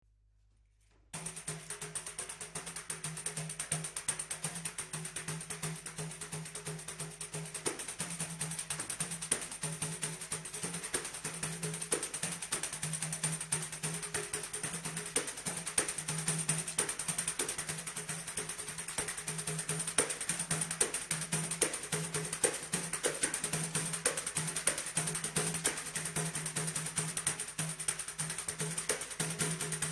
pandeiro

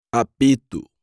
Apito

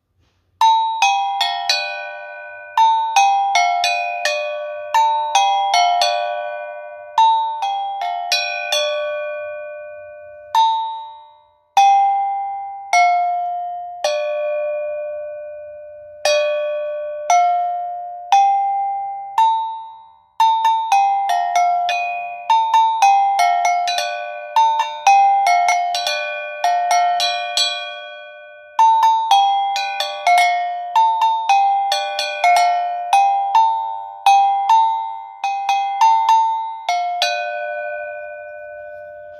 agogo pentatonic sound sample

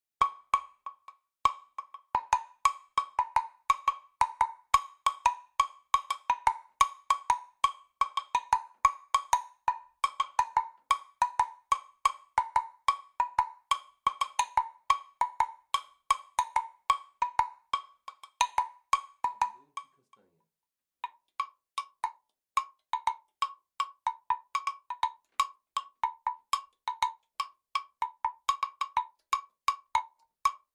agogo

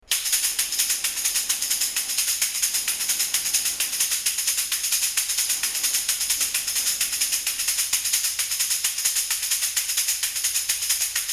Chocalho

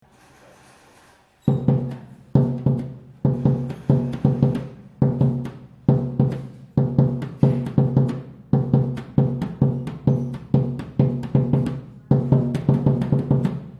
surdo; ivsom

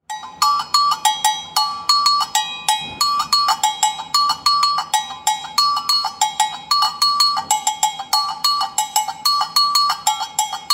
Agogo; Pearl

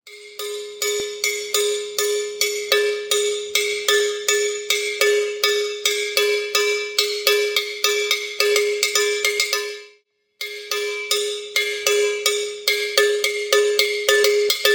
Hand Gongue

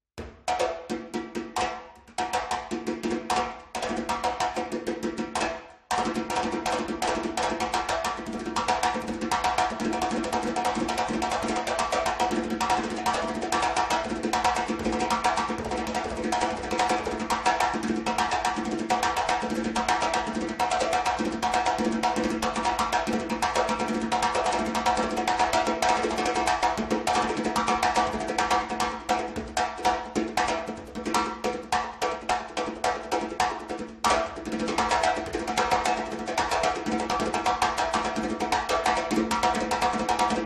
timbal

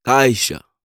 Caixa

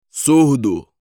Surdo

